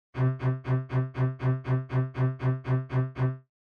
machine gun effect

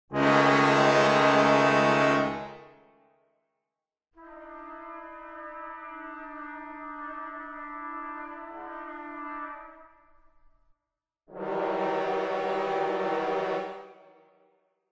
brass clusters

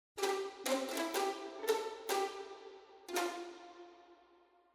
1st Violin Bartok Pizzicato

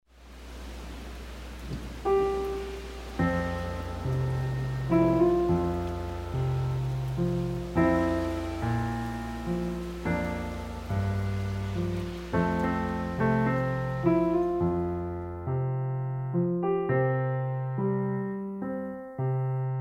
Rainy Days Piano Violin

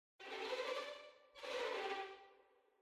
violin ricochet

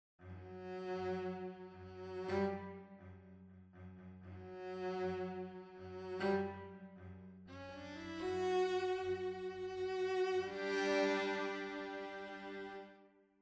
Violoncello

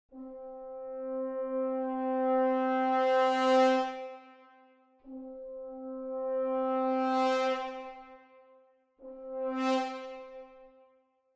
brass cresc

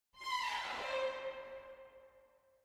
1st Violin 8va Dn

